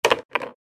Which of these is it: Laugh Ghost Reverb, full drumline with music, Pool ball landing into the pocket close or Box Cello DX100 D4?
Pool ball landing into the pocket close